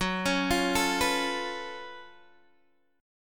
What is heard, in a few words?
Gb Minor Major 11th Gb down Guitar DADGAD